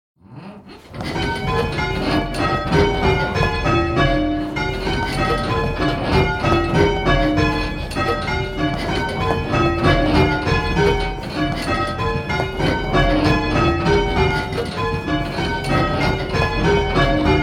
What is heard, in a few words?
Ionian Grandsire, bells, Grandsire Doubles; Major; 5-Bells; Part 2 - Methods